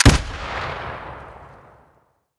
rgm40 muzzle mono

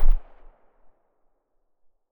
silencer far, weapons; vpo101

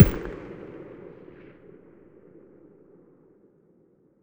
mg muzzle mono very far, weapons stationar

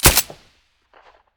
m9a3 silencer stereo; beretta

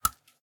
shell 12ga sand; STALKER; IWP

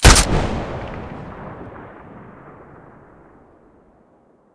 svu muzzle mono

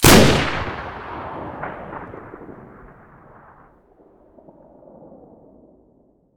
aa12 muzzle mono, weapons; STALKER; IWP

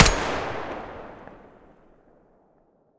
muzzle mono, weapons; fort

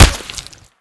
bullet hit, bullet collide; STALKER; IWP

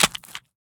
pistol drop gravel; STALKER; IWP

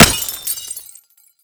glass, bullet collide; STALKER; IWP

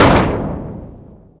metal crash; STALKER; IWP

